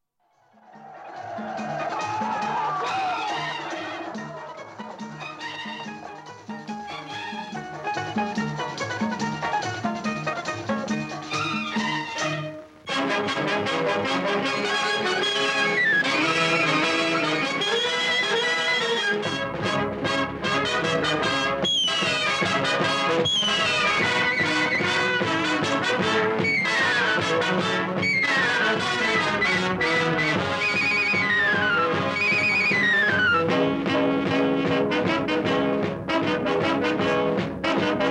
Theme Instrumental; Zabak

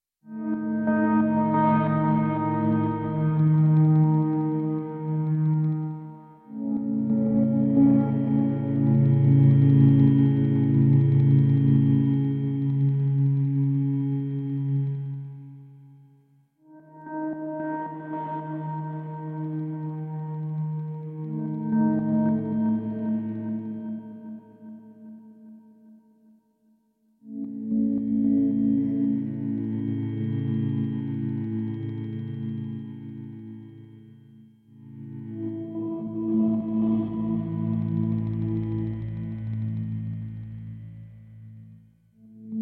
Dreamy Piano Instrumental; axone 2020

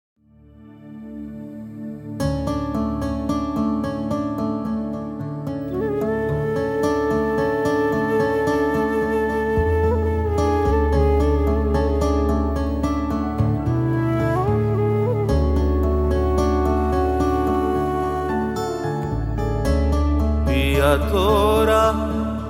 Male; raincoat 2004

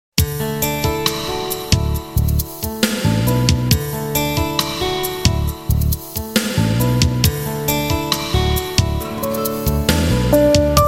Music Piece With Flute; chalte chalte 2003

